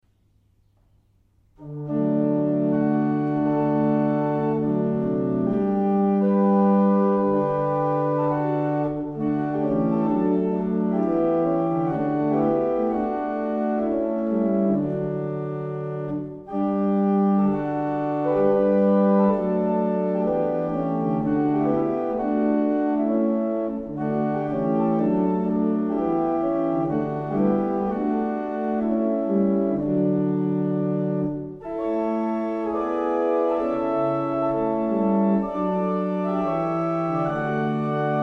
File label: barrel organs; Faulkbourne